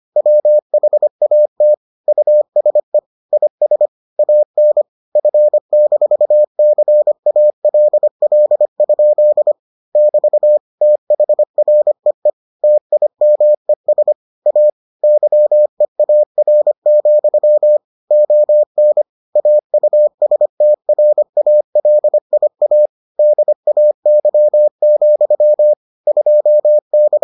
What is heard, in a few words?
morse